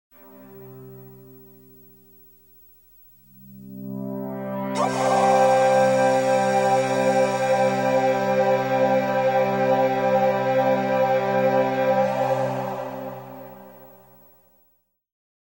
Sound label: synthesized notes; SOUND EFFECTS1